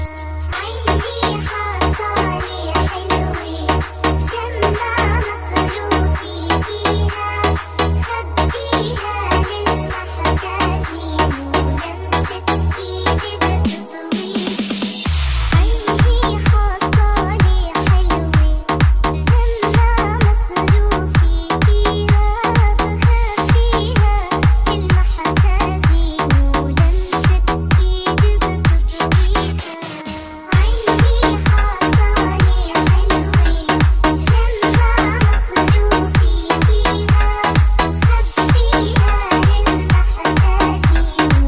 Arabic Remix Ringtone; Arabic Ringtones

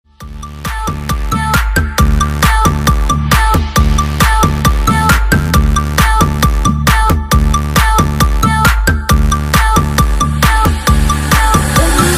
iphone xs max, Ringtones

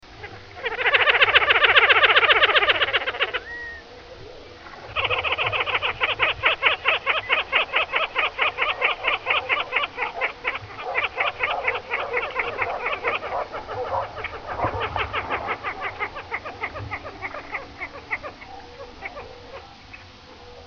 The sounds of birds

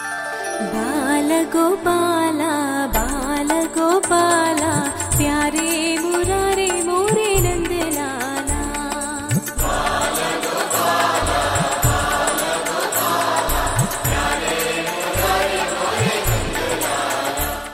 Bhakti Ringtone; Art of Living; Religious Mp3 Ringtones; Devotional Ringtones

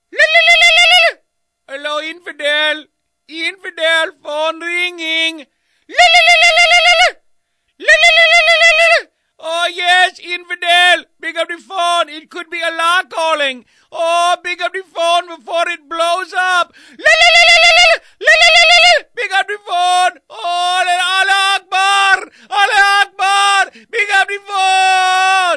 ring, Children mobile tunes

